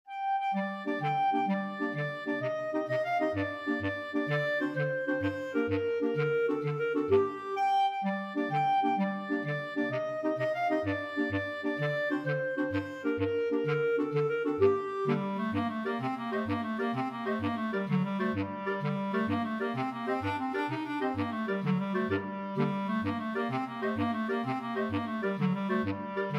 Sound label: 5 clarinet, clarinet choir